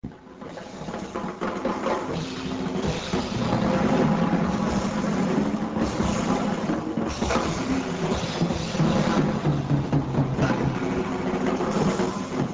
rhythm

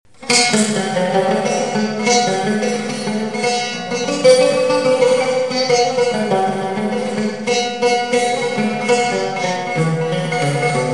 oud

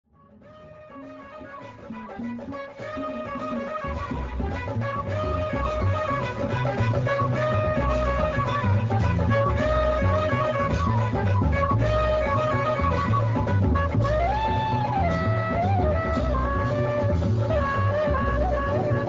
world jazz

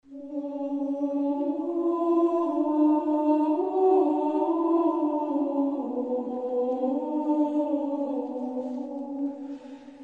o vis aeternitatis nonnen

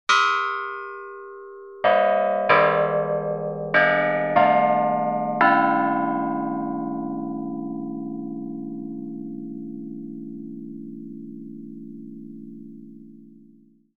Bianzhong

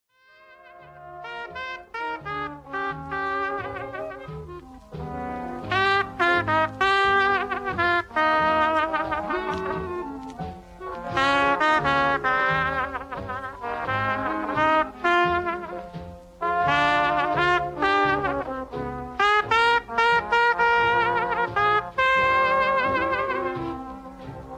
trumpet

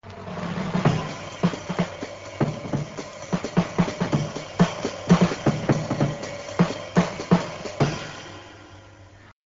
rhythm

